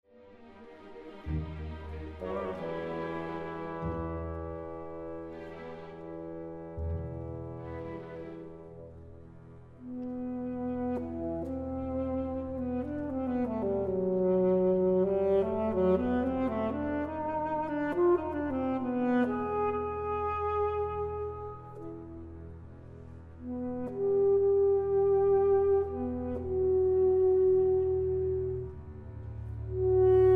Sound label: Sax